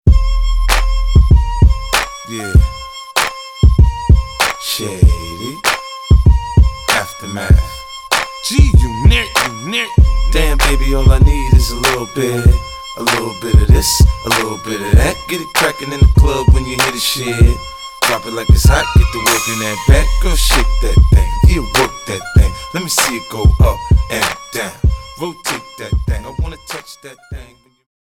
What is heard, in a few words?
Hip Hop Ringtones